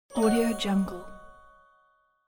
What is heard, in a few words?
magic wand